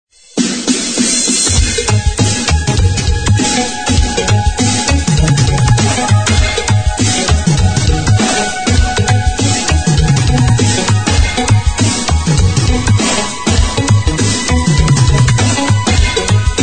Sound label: Ringtone